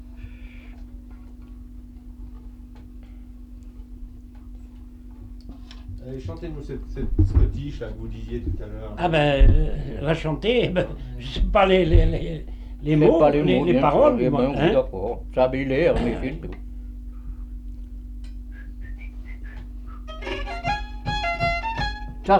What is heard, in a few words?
mazurka